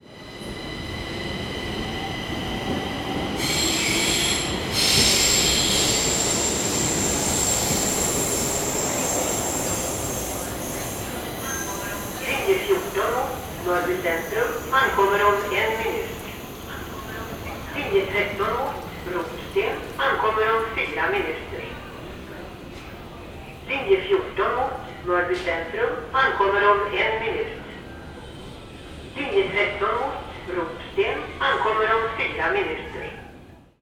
Swedish Subway

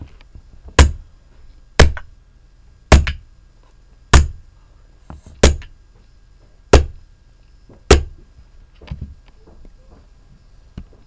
Hammering

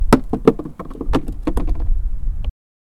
Box Falling On Ground

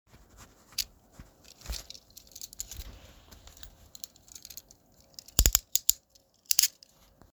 Cuffs Locking

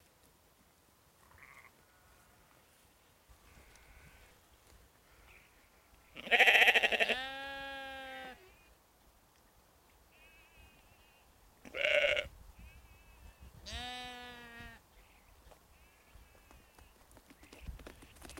Sheep and lambs

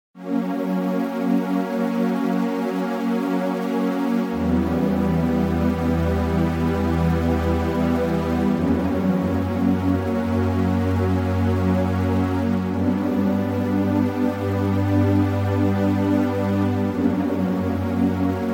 Epic Synth Pad

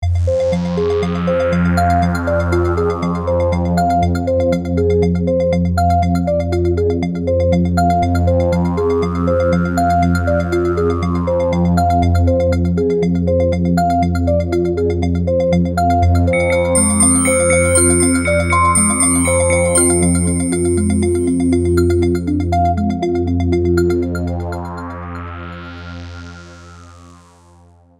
Apocalyptic Lullaby

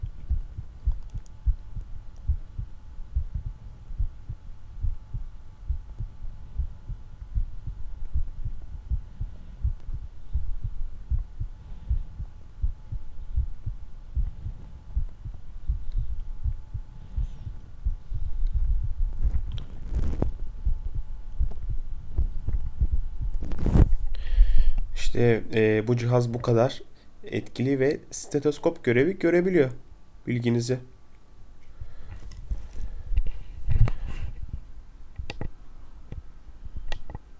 Sound Of My Heart

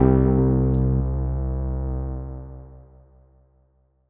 Wrong

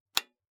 Button

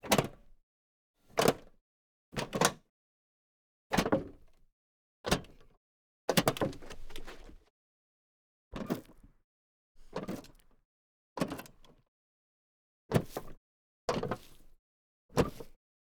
Foley Object Toolbox Metal Pickup Mono

